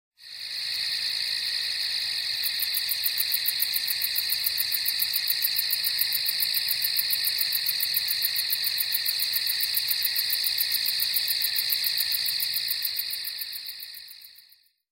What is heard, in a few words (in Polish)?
swierszcze